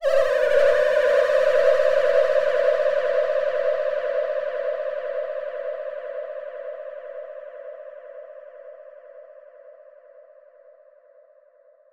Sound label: FX 120bpm; Zenhiser